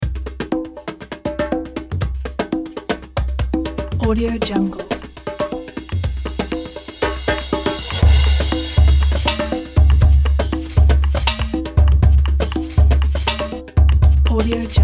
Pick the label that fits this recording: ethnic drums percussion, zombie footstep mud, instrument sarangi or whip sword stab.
ethnic drums percussion